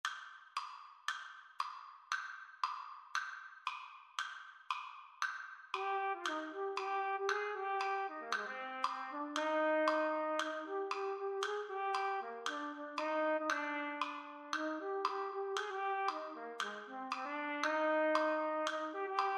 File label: Rodeo Parade March - Bb Trumpet; Trumpet 2 Only